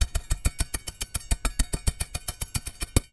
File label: Industry Shovel Chopping Dirt